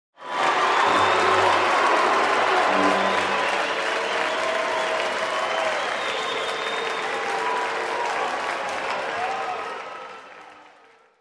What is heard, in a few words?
Crowd cheering and clapping